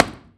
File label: Wood Fall; Props; HauntedBloodlines